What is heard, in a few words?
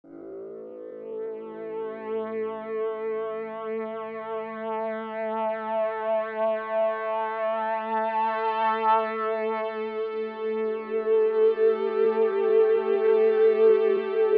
synth pad, ethno, 125, A; Sphere